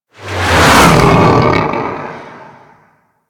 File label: Grineer Fighter Ship B Close Short Fly By, Space Fighter Ship Engine; Warframe; Railjack; Enemy Ships